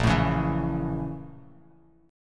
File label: A sharp Minor Major 7th Flat 5th, Bass Standard; A#mM7b5 strummed; JGuitar